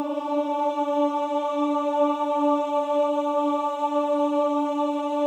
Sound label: choir ohs D4; Spectrasonics; Symphony of Voices